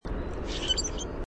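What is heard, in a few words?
squeak, Common Grackle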